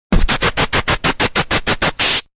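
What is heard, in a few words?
Beatbox, Vocal drums, rock beat (human voice); Beatbox 03, vocalization, urban, hip hop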